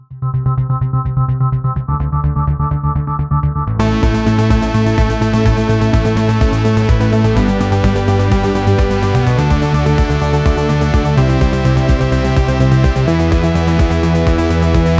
romantic dance songs

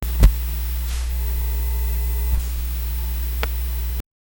asmr to ambience noisy tv; noise

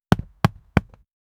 football catch sound

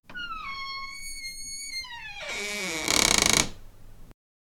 close creaky door; household

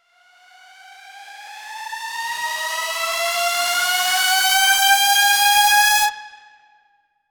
simple but unique trap

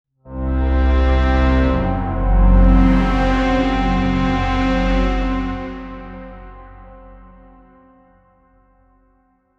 epic cinematic one note

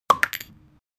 sound effect in a game, item

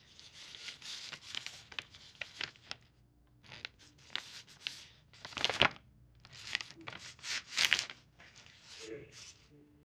frustrated 12 13 yo boy cr, paper

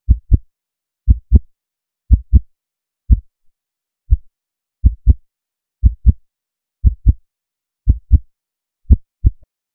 heartbeat stabilizing

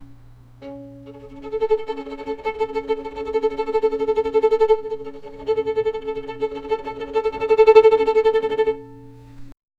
violin played very softly; eerie

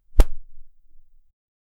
slap a wet sponge; cloth

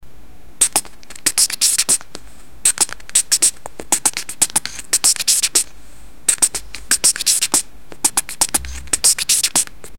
beatbox effect graffiti; beatbox effect graffiti p

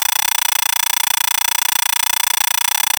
electrical interference, 16-bit

